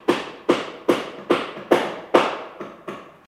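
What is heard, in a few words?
Hammering Construction Heavy; hammers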